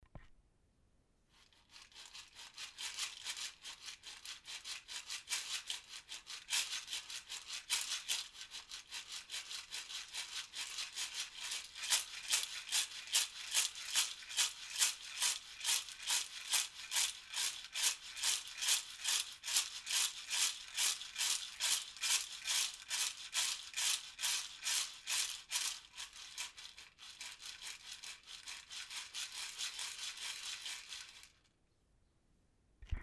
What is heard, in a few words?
Spirit Wave sound